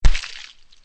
Death

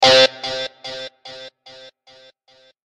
Guitar C; Pokemon Reborn E19

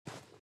run sand

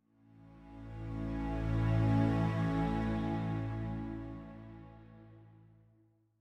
85bpm

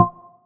kids car switch item

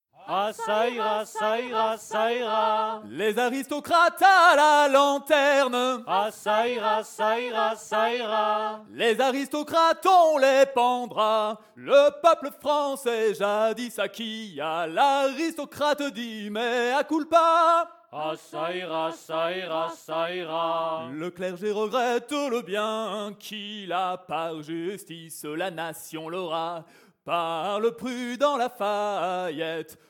Choir; assassin s creed unity gamerip 2014

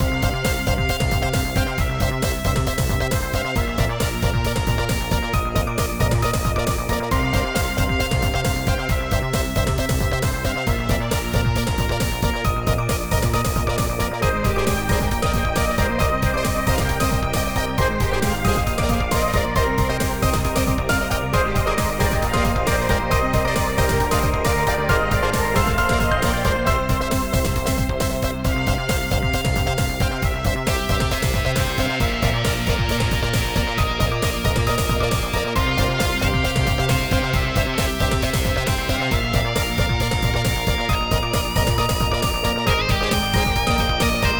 menu

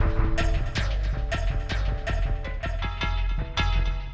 sounds music; Halo Combat Evolved; Xbox

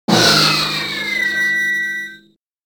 GAME OVER; magical tetris challenge; Wrong Line

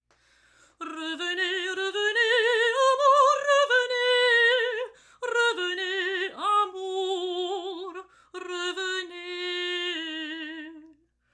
Solo Women; Assassin's Creed Unity

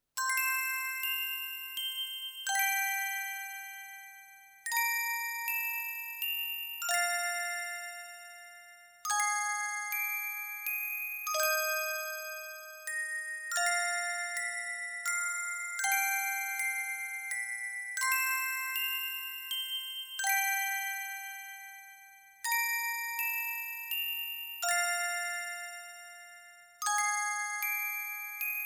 orgel ver.; Koichu Koi ni Koisuru Kataomoi

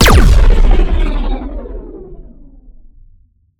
Shot Electron Cannon

